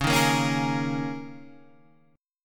G sharp Suspended 2nd Suspended 4th C sharp, guitar